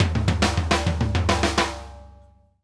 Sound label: Drumworks SP fills 132